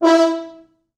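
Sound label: French Horns staccato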